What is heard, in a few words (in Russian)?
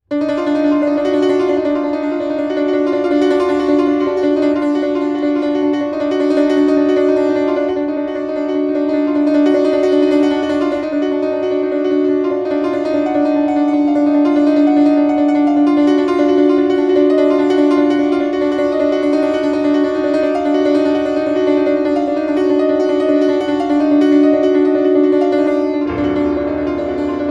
Токката на 4 звука